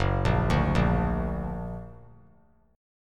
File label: Gb Augmented; Gb+ arpeggiated